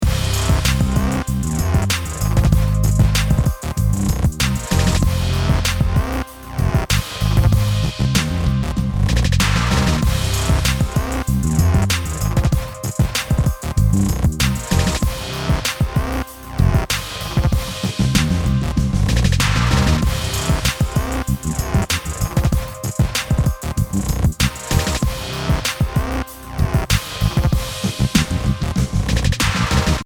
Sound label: Bass Song; AtomicTransient